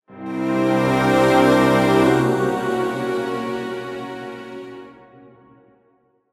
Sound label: Jingle, Item Acquisition; YU-NO A Girl Who Chants Love at the Bound of This World